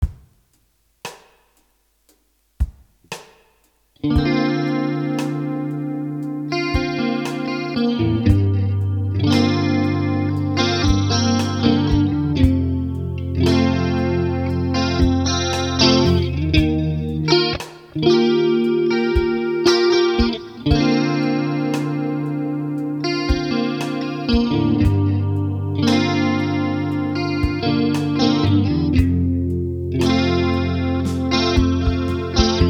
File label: Chorus